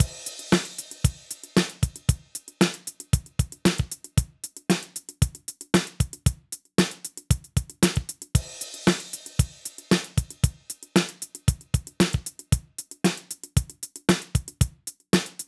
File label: beat